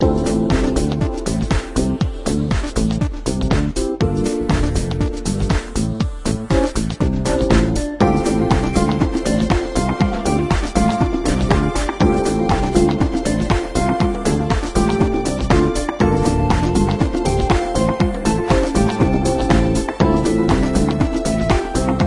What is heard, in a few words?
Chill